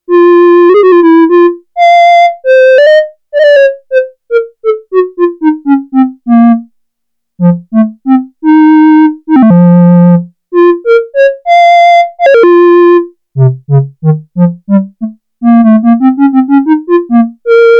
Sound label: CLARINET, Roland SH-101; Factory Patches